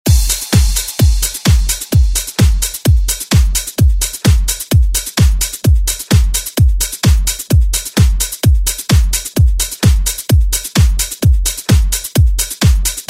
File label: 80s ReDrum